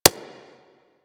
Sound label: Infested Minion Step Default; Warframe